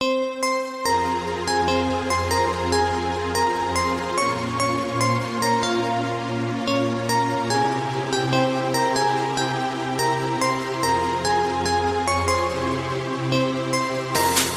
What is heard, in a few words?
sonorous tone